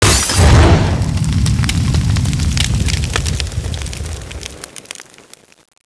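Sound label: molotov explosion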